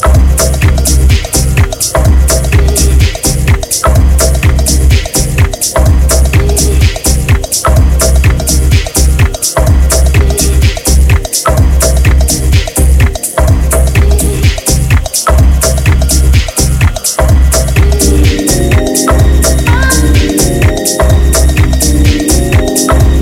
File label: Inst